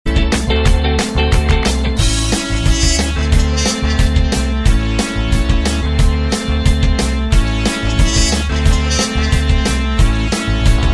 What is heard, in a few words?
Vivo Ringtone; Vivo V29e (India)